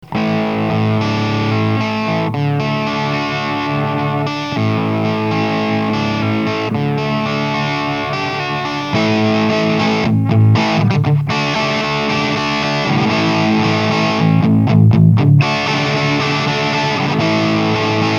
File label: Bluehead strat, tube